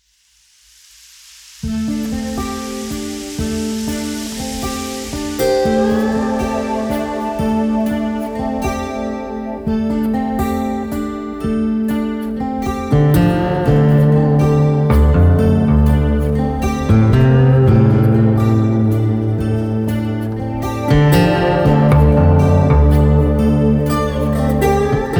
Open Music; moul

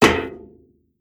Metal Run; moul

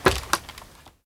Wood Ladder; moul

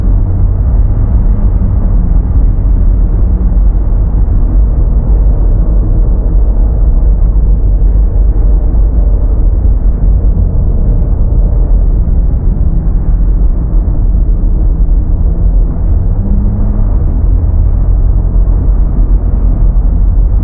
Sphere Rotation Loop

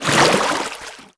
Water Splash; moul

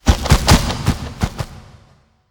Bahro Wings

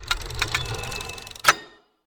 Ring Puzzle

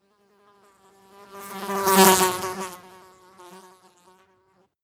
Random Fly; moul-assets

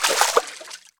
Water Run; moul

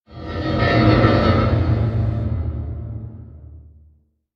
Rand Amb

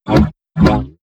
Button Click; moul

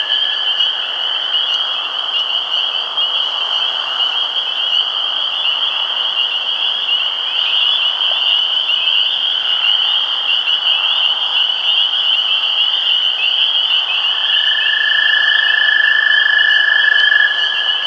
Swamp Amb Crickets Loop; moul

